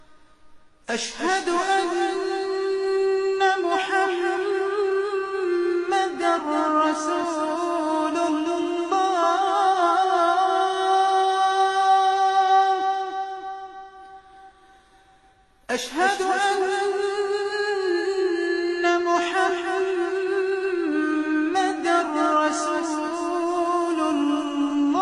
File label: Azan Abu Dhabi